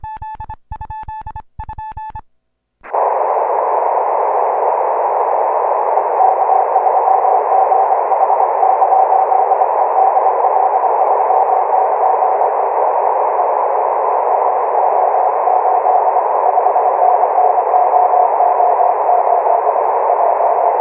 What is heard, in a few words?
2320 CW; DL3YEE; IARU 2014